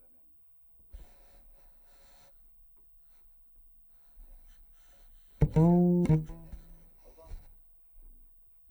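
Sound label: Contrabass DI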